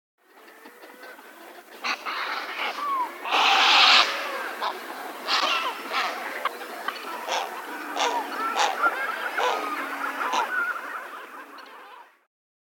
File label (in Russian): seraya caplya golos